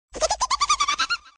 duck sound

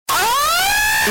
earrape scream

